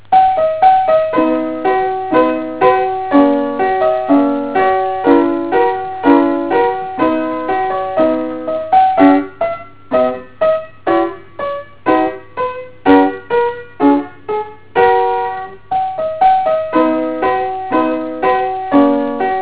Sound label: piano